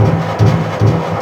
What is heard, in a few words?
Pump Station Loop; Props; Loops; Warframe; Venus Open World; Venus Landscape